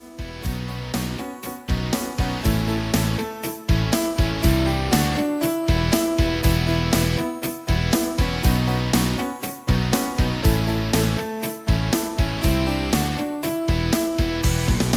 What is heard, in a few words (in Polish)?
wersja instrumentalna